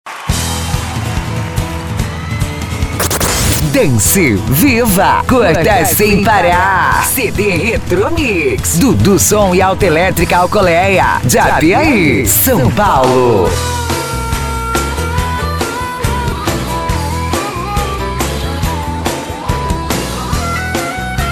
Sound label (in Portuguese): rock nacional; cd retro mix